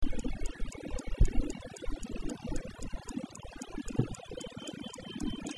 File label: ticking